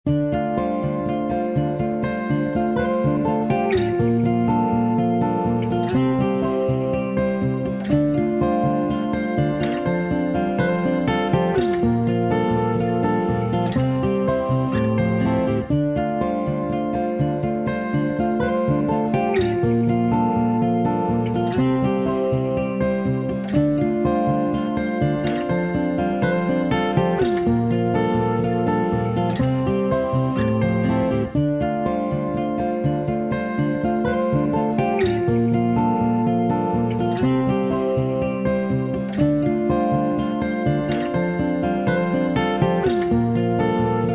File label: AGit bypassed; xfilter